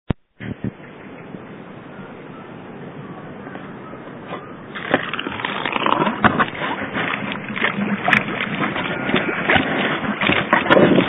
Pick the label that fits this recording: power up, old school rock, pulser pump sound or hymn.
pulser pump sound